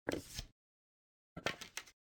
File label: pick up and drop arrows; baldursgate